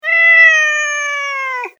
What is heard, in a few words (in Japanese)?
ネコ; 自然・動物